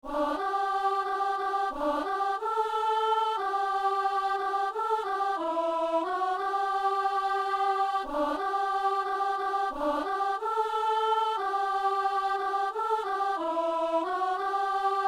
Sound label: alto